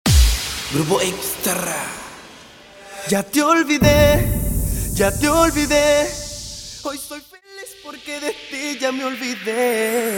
acapella starter 128 BPM

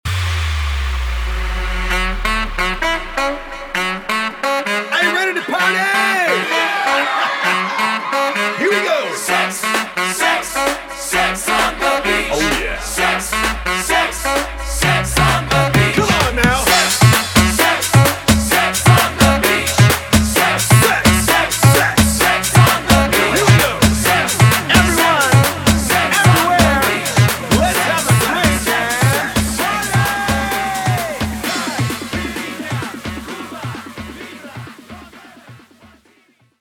intro outro 130 bpm

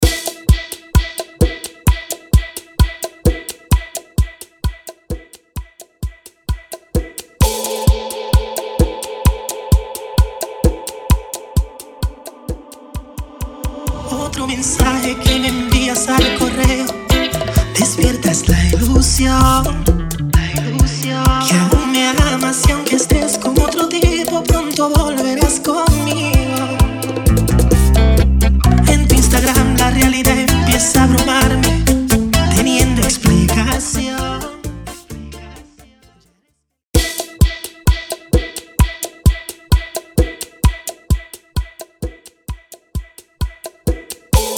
Intro Outro Break 130BPM

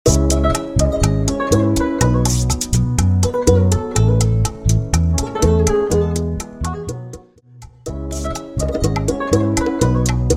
intro live 123 BPM

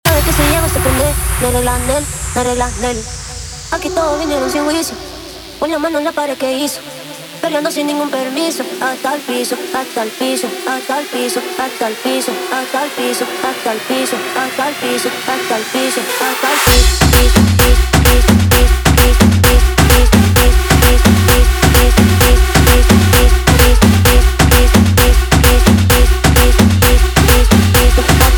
guaracha remix 130 bpm

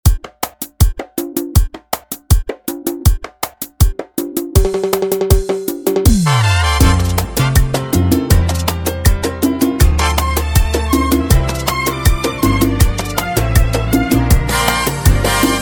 intro outro 80bpm

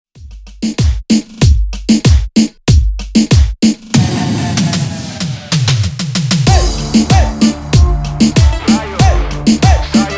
Remix Intro Outro

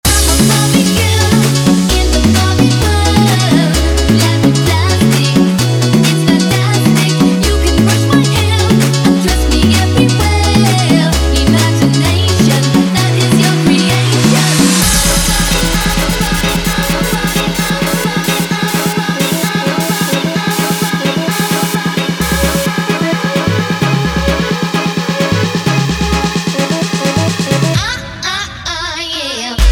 baby girl tribal remix 130 bpm

